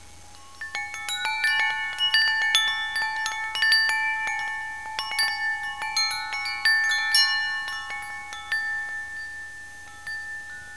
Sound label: chime